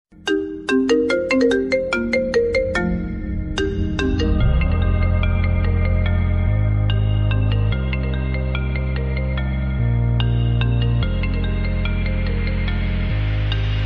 iphone pro max original remix ringtone

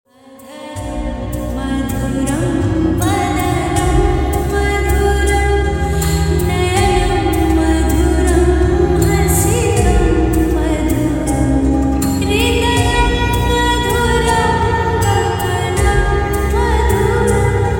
lofi new reverb

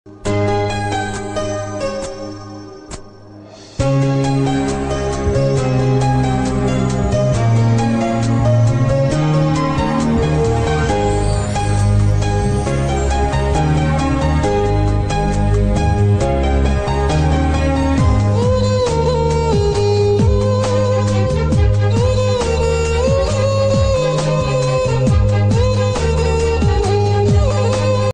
bgm mind relax music; rain status; kannada

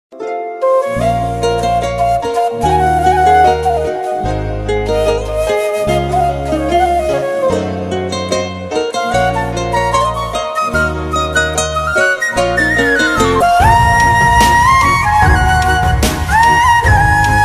best flute ringtone; sufna movie

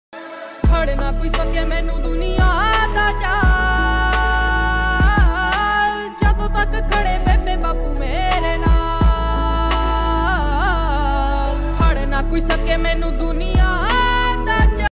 punjabi ringtone punjabi song

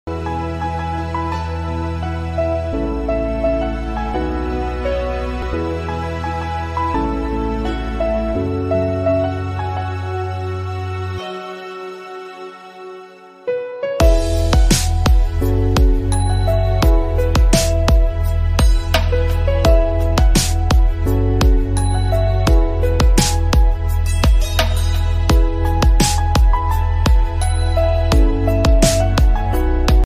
instrumental ringtone love bgm ringtone; sara ali khan

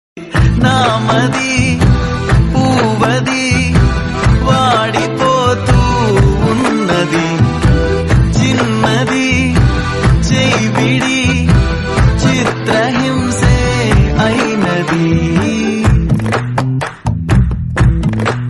sad ringtone telugu sad ringtone song ringtone; thiru movie

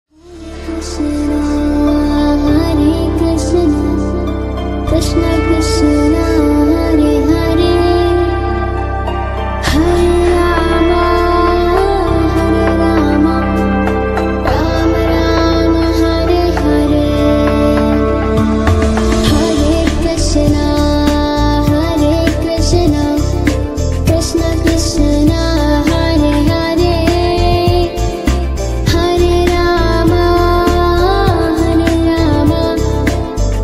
ringtone devotional ringtone; hare krishna hare ram

